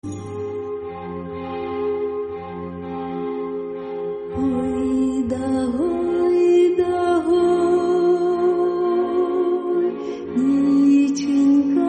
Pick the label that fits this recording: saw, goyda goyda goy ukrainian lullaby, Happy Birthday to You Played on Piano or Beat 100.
goyda goyda goy ukrainian lullaby